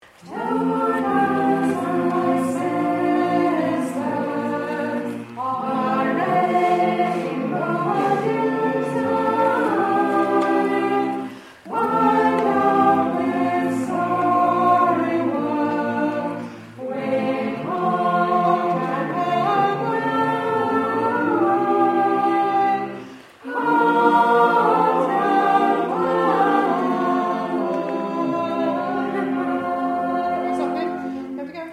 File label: All Hum